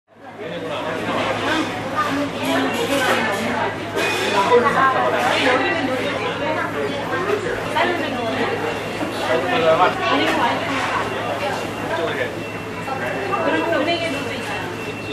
zvuk v shumnom kafe

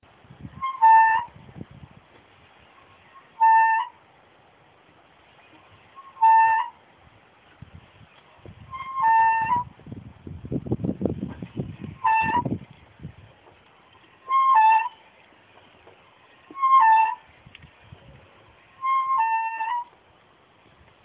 zvuk kolodca

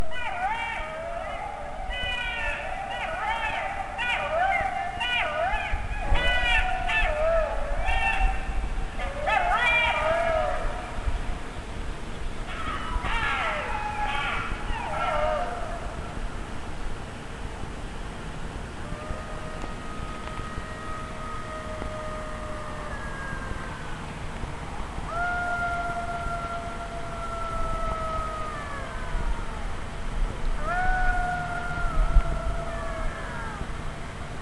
agojskie shakaly

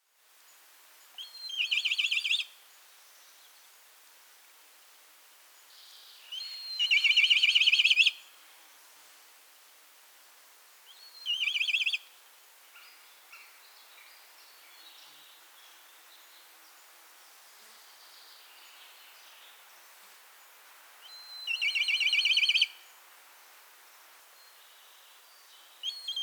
zvuk chernogo korshuna

